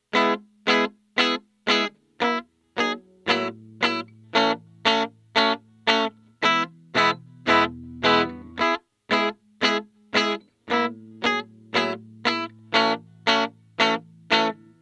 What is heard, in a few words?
6V6; Epi Amp